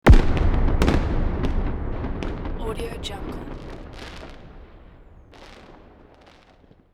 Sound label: lo fi firework explosion sound